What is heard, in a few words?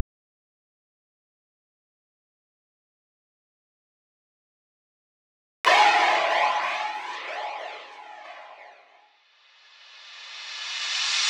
Drum Loop - 170 BPM FX; Cymatics; Anthem Drum Loop